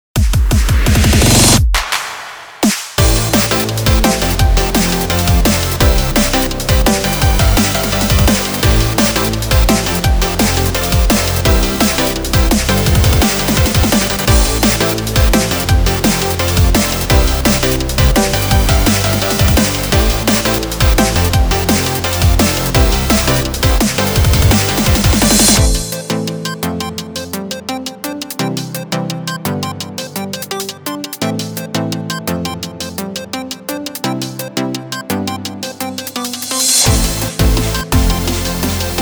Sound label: karaoke